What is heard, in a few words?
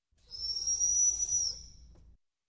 Hissing air